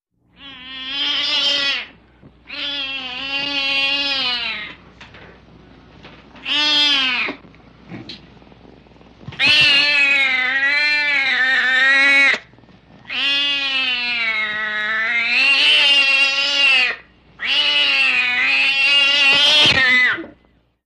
Angry Cat Yowling I; animals cat

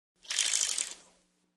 Fly Reel Spin Line Out, fishing

